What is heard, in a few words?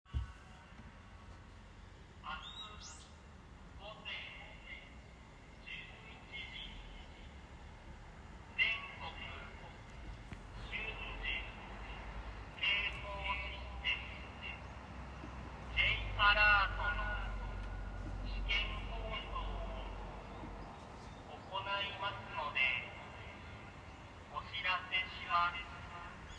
j alert